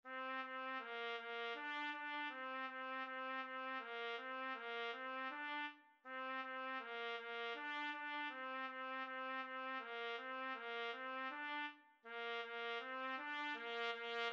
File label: trumpet